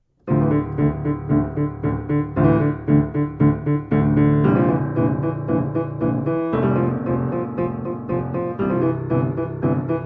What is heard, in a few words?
Adventure Theme Piano; Heroes of Ruin; Original Idea